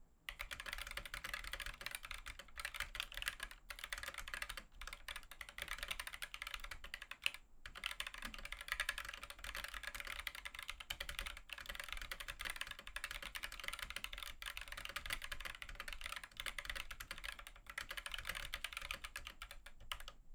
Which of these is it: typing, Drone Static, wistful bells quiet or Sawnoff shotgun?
typing